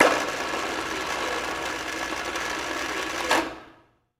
Chain Crain Type

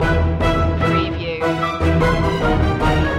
neon nightscape distance loop

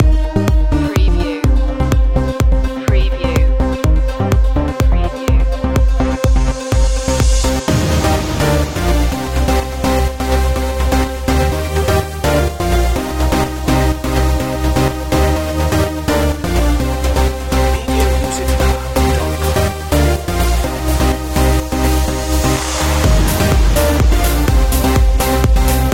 swedish trance

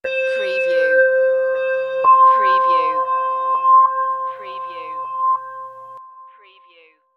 High Evil Tone

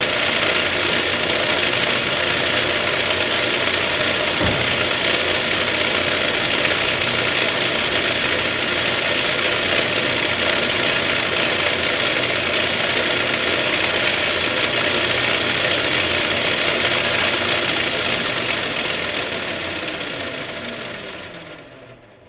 Drill Press

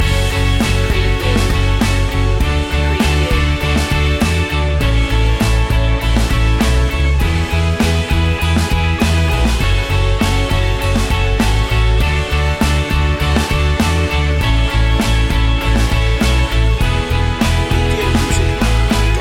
now and forever loop

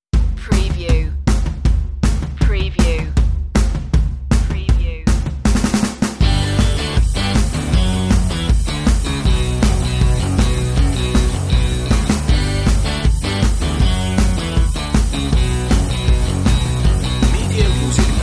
dirty fun underscore

